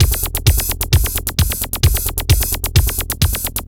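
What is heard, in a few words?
drum loops, right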